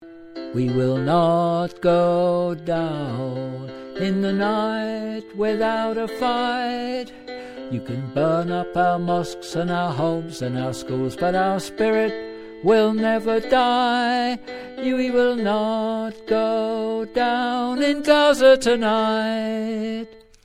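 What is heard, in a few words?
chorus bass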